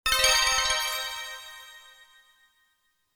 Bell Chord

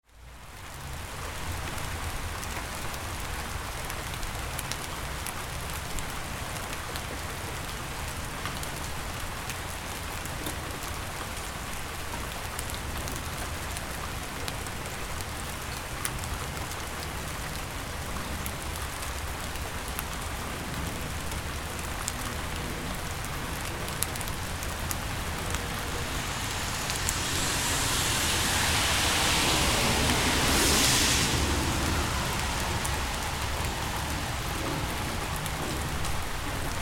Moderate Rain with Passing Cars and Airplane

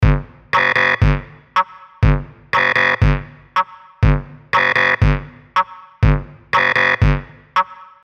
Weird Lab Loop

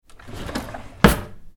Kitchen Drawer Close with Silverware

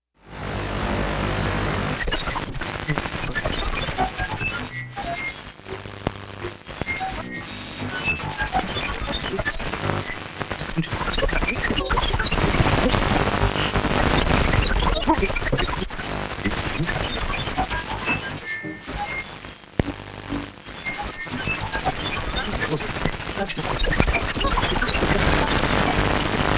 Digital Radio Noise

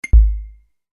UI Quirky

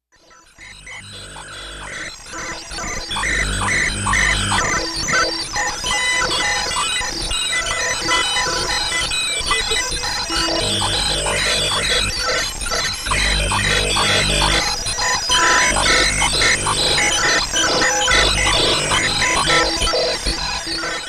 Digital Grunge

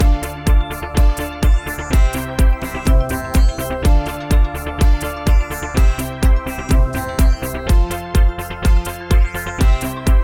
Power Puzzle, puzzle music

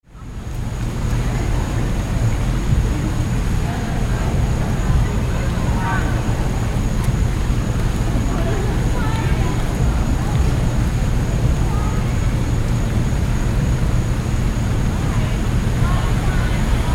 Street Ambience

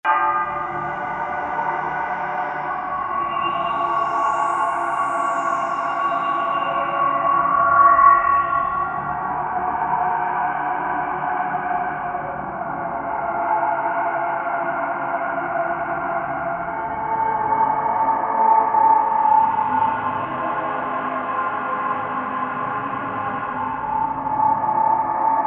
Horror Game Intro LoFi; Horror/Surreal Music

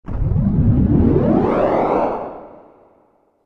Power Up

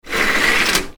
Blinds Opening

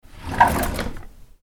Kitchen Drawer Open with Silverware

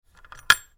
Drawer Latch

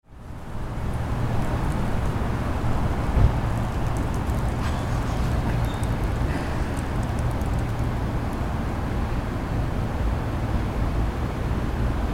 Street Ambience

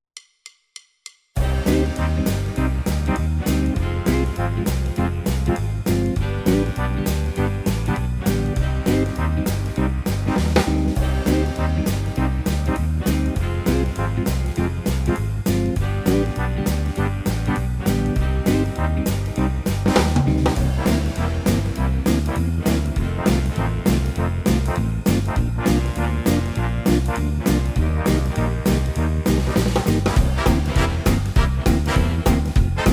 Big Band Swingin

